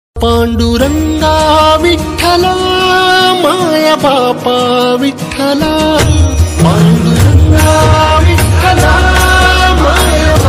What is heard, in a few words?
ringtone marathi god ringtone; panduranga viddala; mauli ringtone